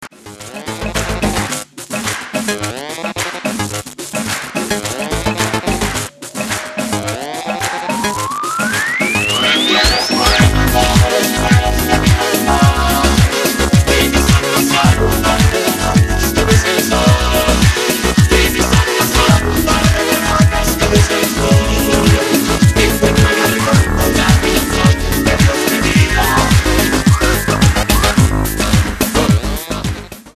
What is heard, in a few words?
electric soca